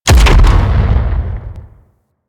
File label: Step Metal; Warframe; Teralyst; Ropalolyst; Steps